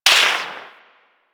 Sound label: Bullet Whizzby; Warframe; Weapons